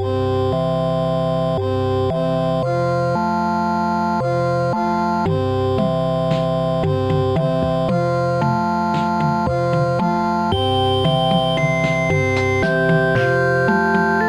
rock chorale; Instant Music

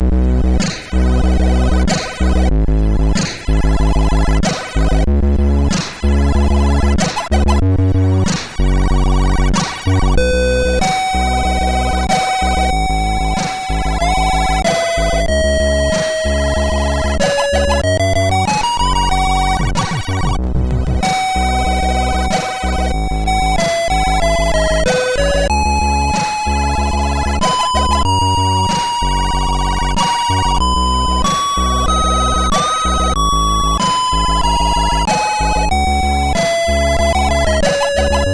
sid, MOS6581R2; Toon Noter; Dgazz

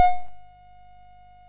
sid MOS6581R2; Magic Serpent

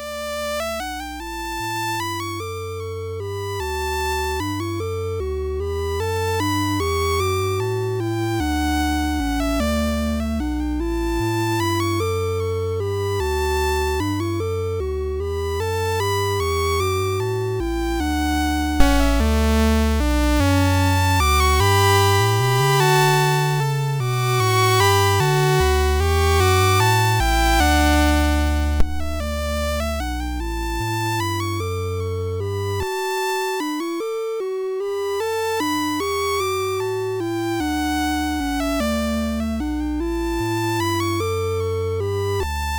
Christmas Music, MOS6581R3

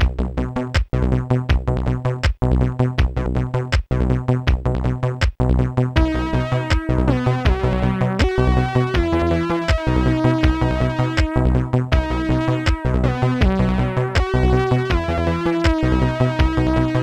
synth mix; Sonix Music Driver

